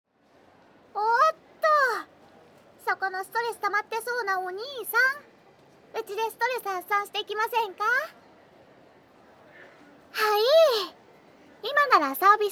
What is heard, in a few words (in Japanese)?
極・耳舐め右, ASMR